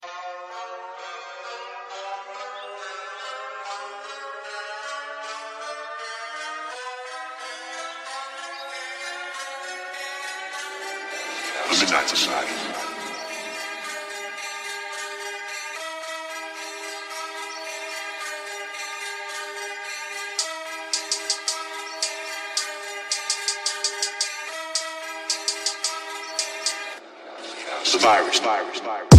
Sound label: instru